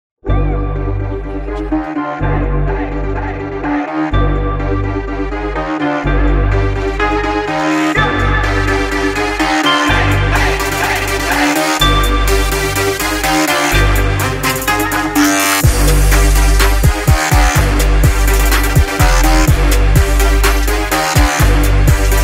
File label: Trap Energy